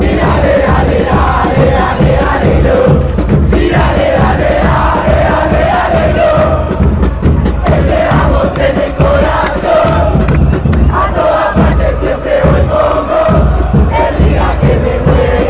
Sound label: huracan chant, argentina